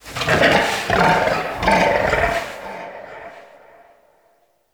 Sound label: Forest Distant Medium Creature Medium Gurgle Snort; Warframe; Grineer